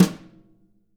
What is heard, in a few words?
BRUSHES, Living Drums